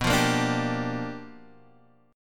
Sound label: G Minor 7th, B; Gm7/B strummed; JGuitar